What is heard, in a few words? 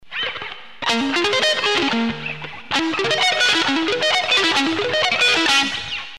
sweep